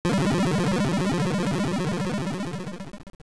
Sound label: Die, dying; Link dying; AOL